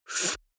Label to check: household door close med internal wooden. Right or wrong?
Wrong